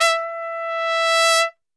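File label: E 3 trumpet; Best Service; Brass Super Section; TRP SWL; 90 s Sample CDs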